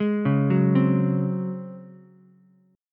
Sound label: C sharp Major 7th Suspended 4th C sharp Ukulele; C#M7sus4 arpeggiated; JGuitar